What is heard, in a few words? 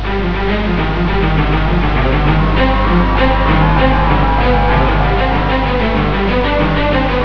Absolute; Symbolic Composer; S-COM Music Samples